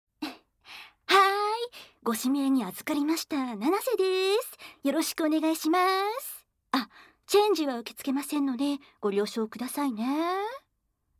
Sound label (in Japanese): 耳舐め; 効果音なし; 女友達のたっぷり耳舐め手コキ